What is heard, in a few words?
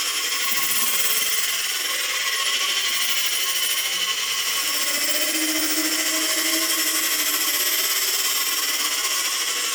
Fairy Sprint Loop; Warframe